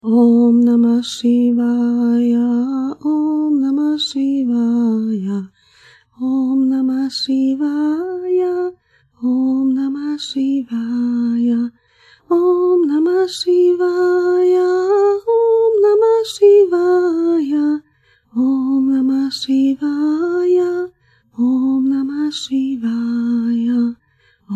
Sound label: Om namah šivaja